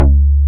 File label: Synth Bass, Roland